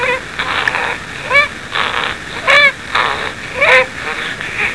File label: Lung Snurgles